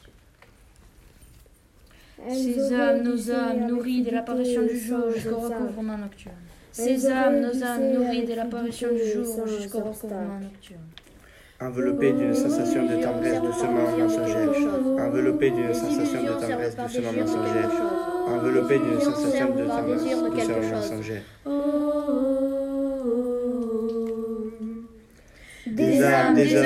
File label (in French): sensation de tristesse